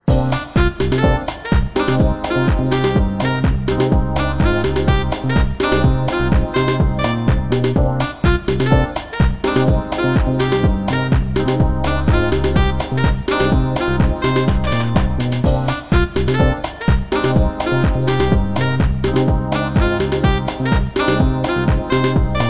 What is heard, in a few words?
Sax DJ Spins; Nokia 5500 Sport